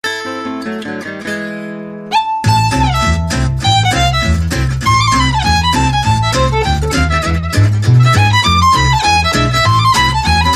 Jazz de Paris

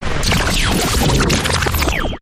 Fluttering Chaos, Taylor Grand

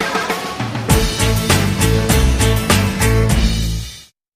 Sting

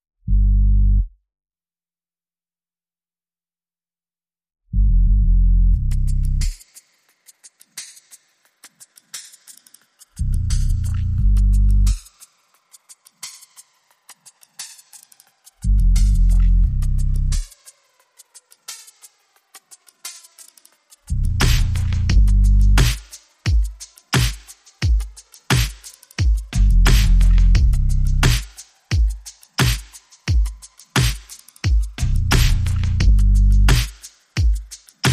Drums and Bass Mix

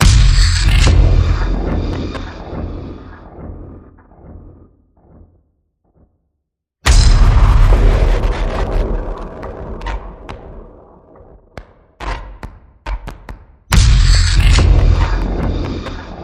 Distorted Ritual Rhythm

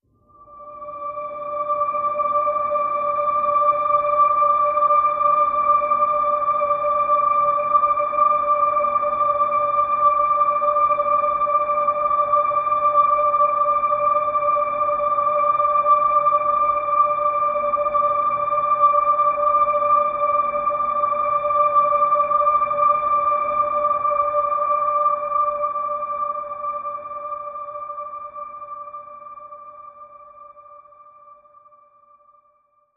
Harmonics D, Alt High

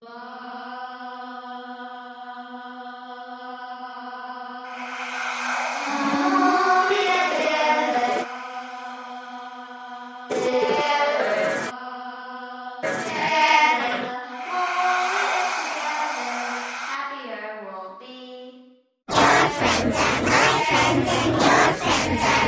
The More We Get Together Horror, Vocals Only

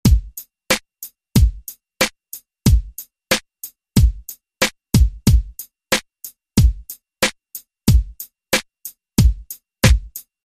Drum Loop

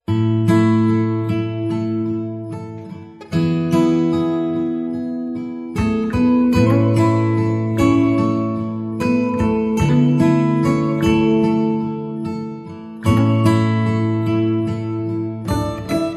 Bright Sunny Afternoon, No Drums

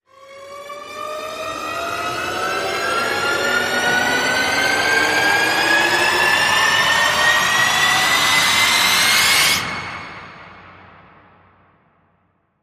Rise Blow A Gasket

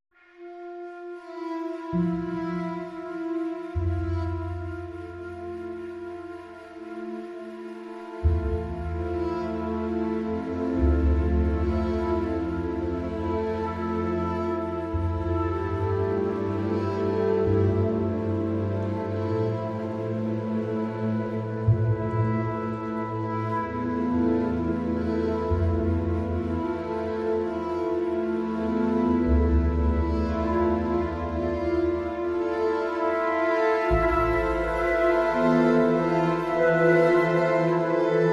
Lullaby, No Vocals

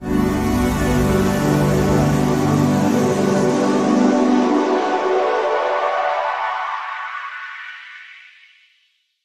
Ethereal Big Sweep Chord Transition

